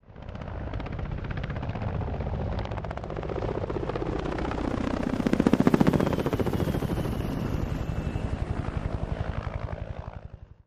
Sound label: Helicopter Flyby; heli fx